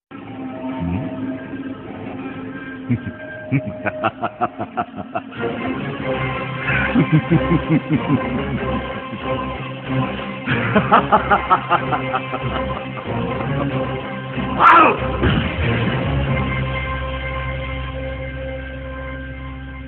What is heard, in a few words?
Tamil Evil Laugh Ringtone; Ajith